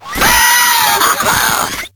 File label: pain; awesomenauts